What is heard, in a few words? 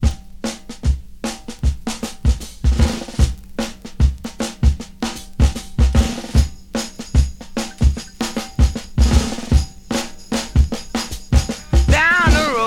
152 bpm breakbeat sample c sharp key, kick tuned to the C# note